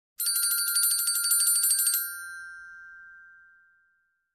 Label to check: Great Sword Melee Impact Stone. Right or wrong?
Wrong